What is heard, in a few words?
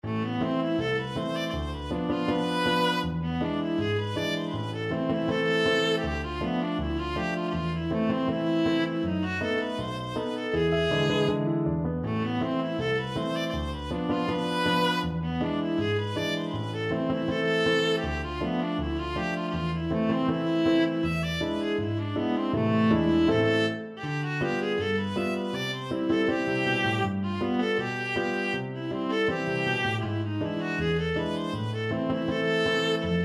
tamoio, viola